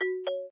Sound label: Connect; SK telesys SK-S170